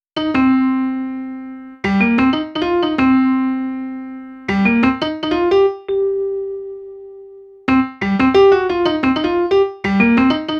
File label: Rhodes, Cinematic Funk; Sonic Foundry (Sony Creative Software); Urban Tone Poems